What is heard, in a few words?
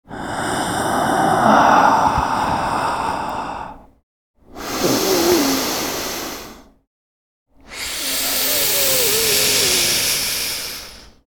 Inhaling